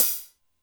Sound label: Cym The Edge, Drum Machines Alesis D4